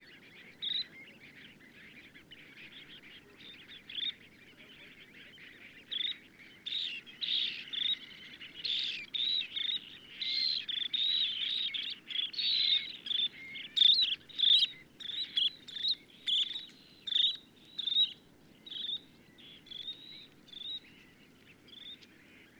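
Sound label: juvenile flock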